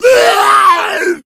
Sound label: hunter pounce; source engine; left 4 dead 2